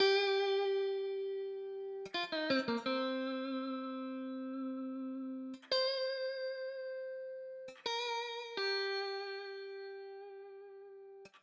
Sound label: Cm 168bpm keys; Yung Boi Drip Vol 6